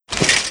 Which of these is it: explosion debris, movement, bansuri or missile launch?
movement